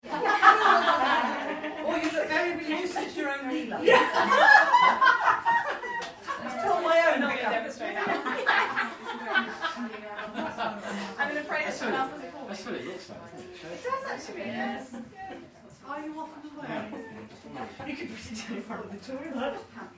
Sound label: Party Crowd Chat and Laughter, Mostly girls - small group; Interior